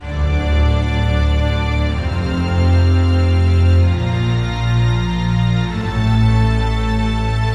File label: d minor huge string ensemble loop part a 127 bpm